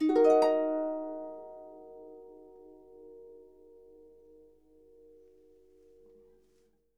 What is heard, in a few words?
D#, Percussion & Harp; Miroslav Vitous